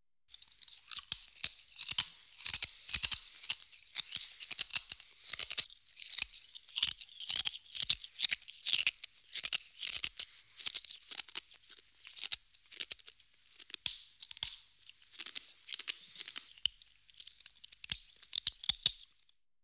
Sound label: Eating Glass, Scary Sounds; 1001 Sound Effects; Halloween Horror Sounds Effects